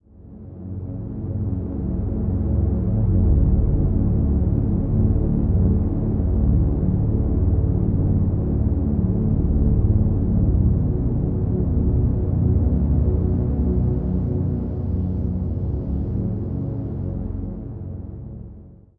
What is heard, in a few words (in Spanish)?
restaurante